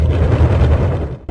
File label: volcano; guns n zombies 2014 pc